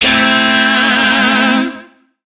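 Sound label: Shawzin Pentatonic Major Chord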